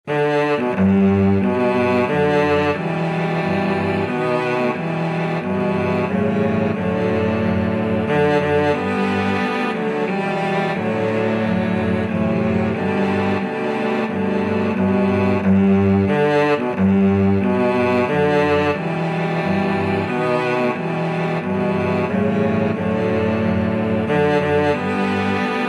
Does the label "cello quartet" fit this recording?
Yes